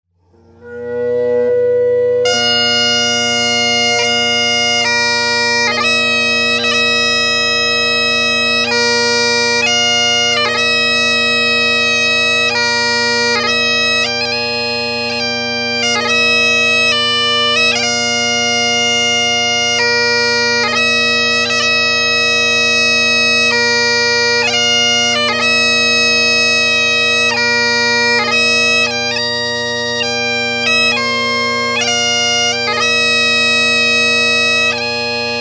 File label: Bridal March